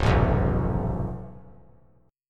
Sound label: Db Augmented 7th Db down; strummed; JGuitar